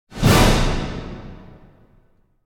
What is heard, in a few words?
Symphonic Hit Scary Appearance Emergence Chord; Hollywood Edge; sound designer's toolkit v2